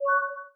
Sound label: glossy interface